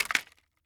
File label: plastic package set down